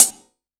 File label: Yamaha CS1x Drums